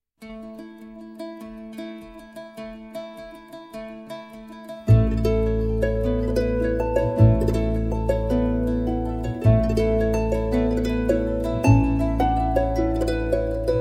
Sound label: Celtic Harp